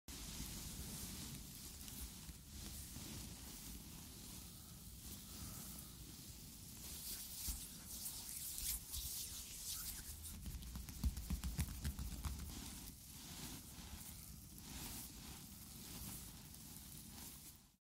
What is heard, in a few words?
Massage